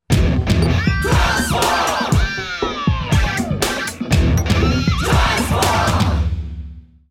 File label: Commercial Bumper Sting